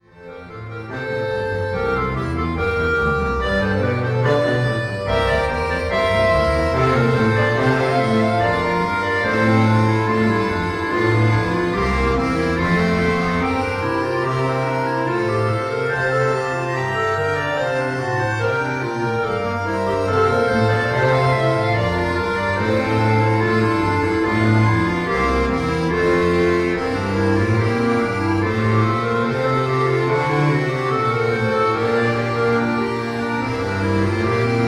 Fröhliche Weihnacht überall Trio Computer

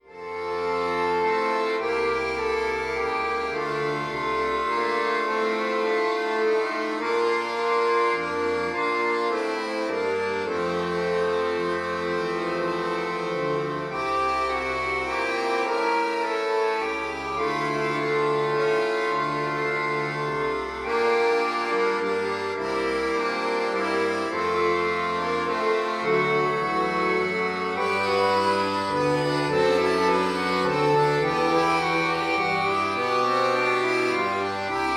Leise rieselt der Schnee Duo, Accordion Duo; Stimmungsvolles, traditionelles Advents- und Weihnachtslied, neu arrangiert für Ak

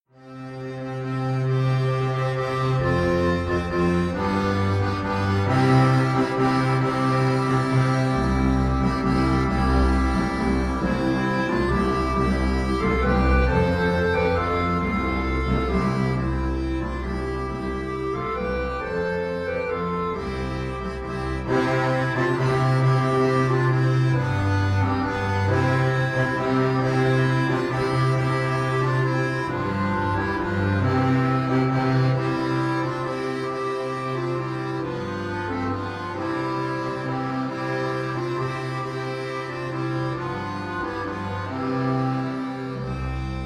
El Nino Querido Trio Computer

